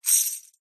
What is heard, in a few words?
foley keys large bunch prison guard short sudden movement